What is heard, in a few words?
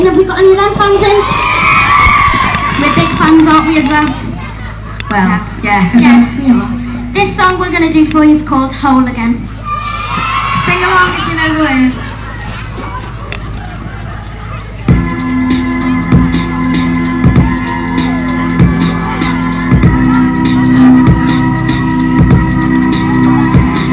singing